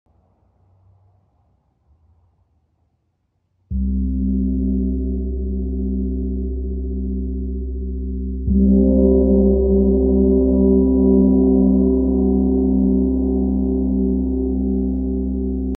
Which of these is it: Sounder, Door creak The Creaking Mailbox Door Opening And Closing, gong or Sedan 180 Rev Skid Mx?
gong